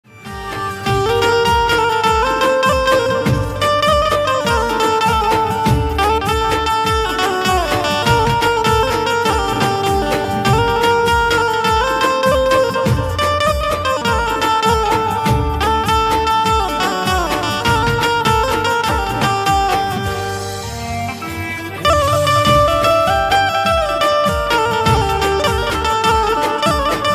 benjo cover benjo music ringtone gujarati ringtone